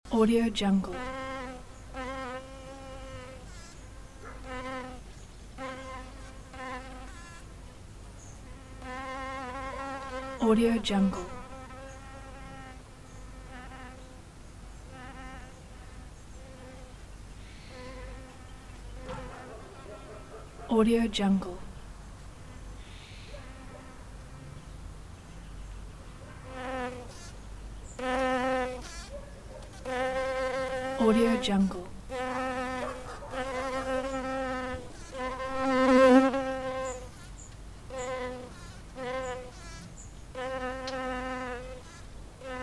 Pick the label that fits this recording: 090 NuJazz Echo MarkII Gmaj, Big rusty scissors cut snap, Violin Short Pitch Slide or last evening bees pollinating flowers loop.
last evening bees pollinating flowers loop